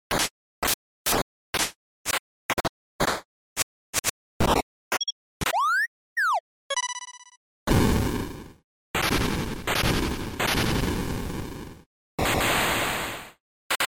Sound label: SNES RPG